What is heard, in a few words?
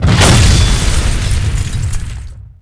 plasma Tank explo; impacts explos